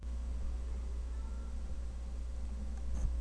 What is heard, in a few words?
cry